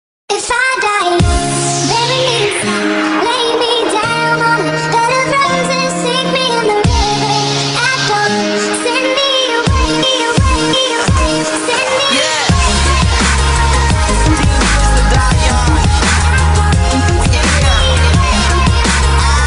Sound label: dubstep remix ringtone